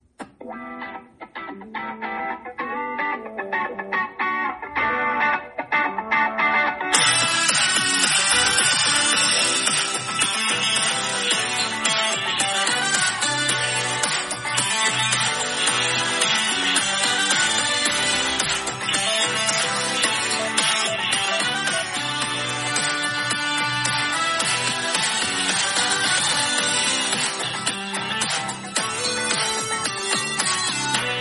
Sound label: Samsung S23 Ultra Ringtone